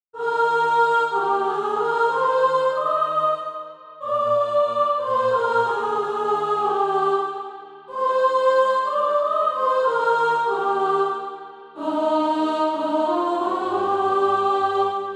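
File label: soprano, SATB Practise Music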